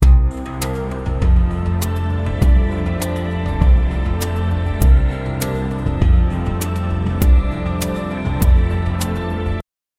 calm background music, meditation

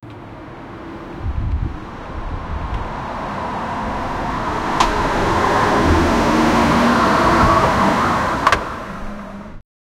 a suv car and truck

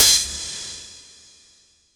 hihat crash, cymbal

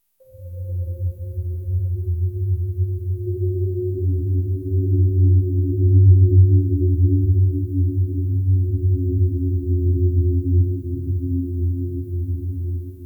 low magical staff hum wit; arcane

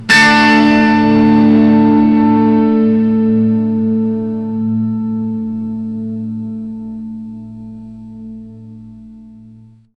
guitar string tore with, pluck

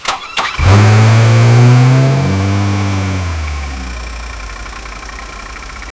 sound of a car engine

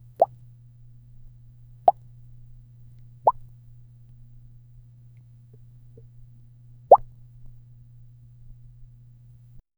low plop of molten drops

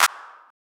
echoed clap musical one s

